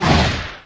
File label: roar; The Elder Scrolls III - Morrowind